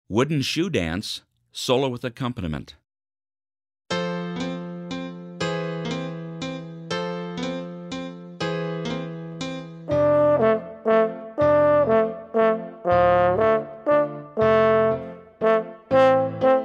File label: fhorn wooden shoe dance solo & piano